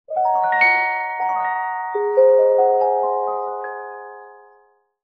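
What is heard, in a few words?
magic gates to a fairy tale open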